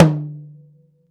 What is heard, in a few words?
TOM, Drum Dry; East Collexion; Drum 1